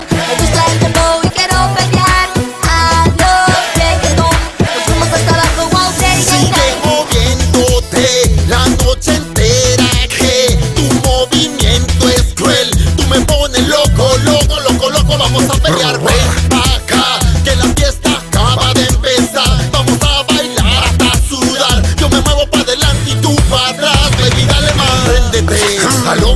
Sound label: reggaeton; Latin